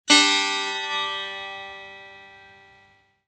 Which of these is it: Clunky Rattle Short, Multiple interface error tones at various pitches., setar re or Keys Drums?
setar re